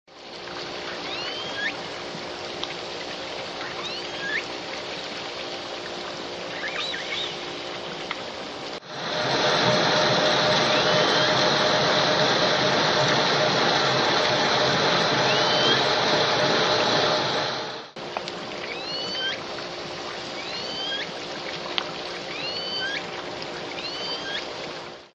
L pod ship noise, SRKW calls and vessel noise

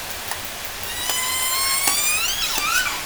call, Orca; SRKW; Alwin; S12

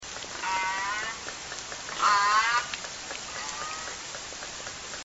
rising low

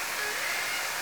call, Orca; SRKW; Alwin; S18

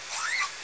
call10 (possible S22), OrcaCalls, SRKW; Alwin

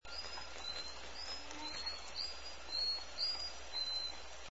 whistle

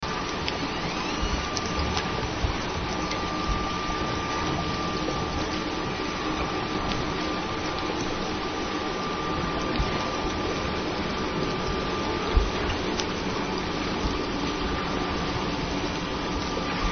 J calls faint, orca

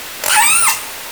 call20 (J or K pod), OrcaCalls, SRKW; Alwin

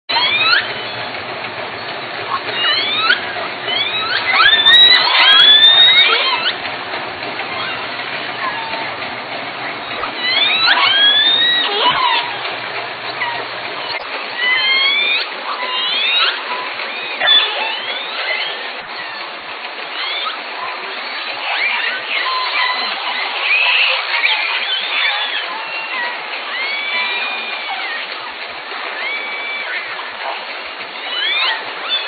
calls

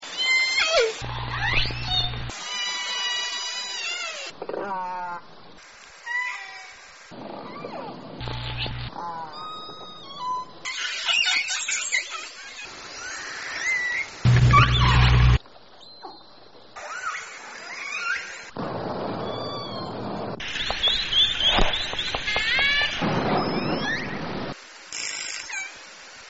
call diversity, orcas; srkws

